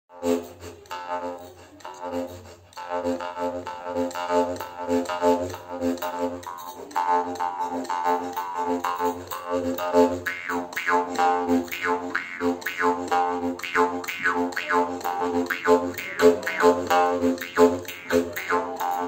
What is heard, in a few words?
Jews Harp; ساز زنبورک